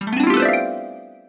magic 8 ball sound

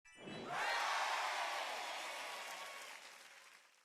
correct

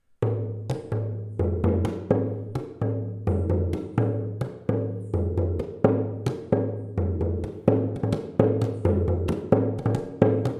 RUBBER DRUM HEAD

